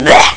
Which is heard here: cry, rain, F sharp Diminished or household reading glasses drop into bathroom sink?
cry